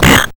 YM Vox Glitch; ChipShop; CHIPSHOP DELUXE; CHIPSHOP HITS

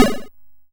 FX Clock; SFX; YM; ChipShop Deluxe; ChipShop Hits

